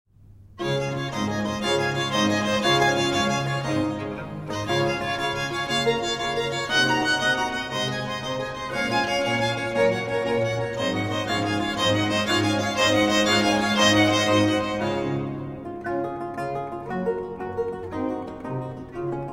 Concerto For Lute, 2 Violins and Continuo in D Major, Allegro